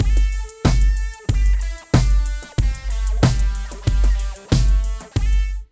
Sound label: LOOP; Phat & Phunky; East West